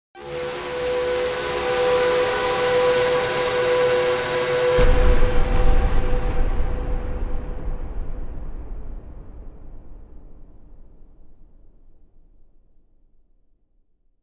Giant Hanger Door, Large hanger door closes door in big space; Sliding Doors